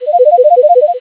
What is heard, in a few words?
ringer